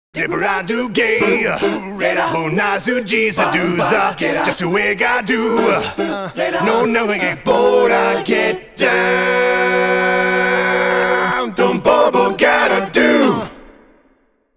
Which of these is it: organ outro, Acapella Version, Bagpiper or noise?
Acapella Version